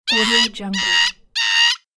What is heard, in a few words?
cartoon bird croaking; fxprosound